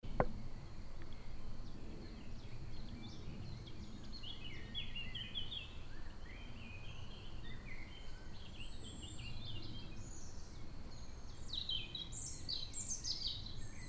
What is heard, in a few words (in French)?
prairie oiseau; poisy